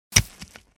Object falling on a dirt surface hit impact

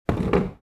Light furniture set down on the floor

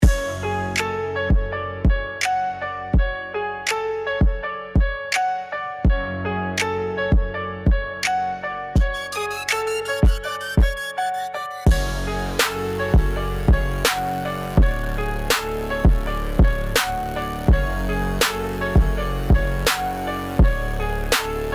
Rap beat

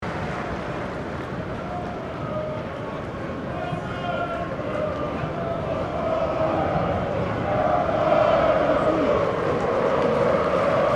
Stadium crowd cheering and chanting ambience

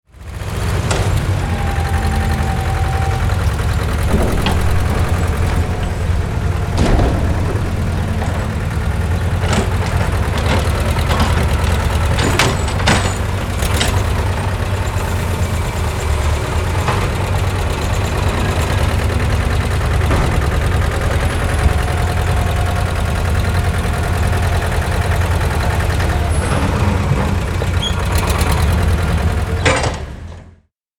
Bulldozer demolition debris impacts

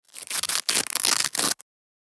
Velcro strap manipulation

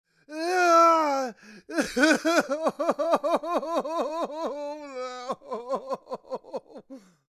Man crying and wailing

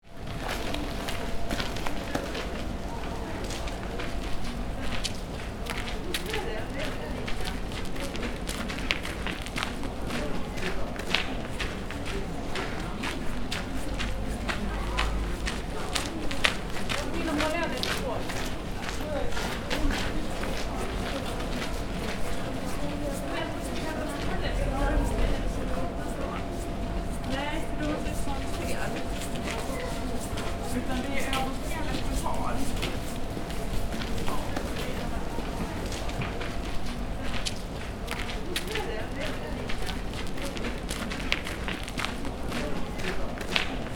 City street ambience busy pedestrians walking by

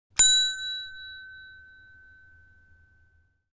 Desk bell single ring with fadeout

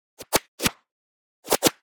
Paintball field ambience flying paintballs

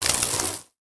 Electric static spark

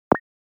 Bubble click

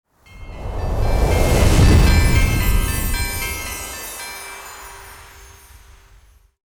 Santa claus arrival magical christmas

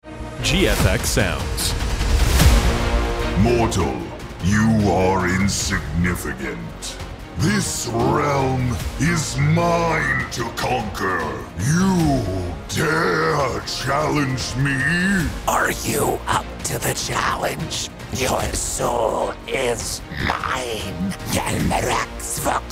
Infernal voices pack

